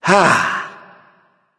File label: yay; Smite; baronsamedi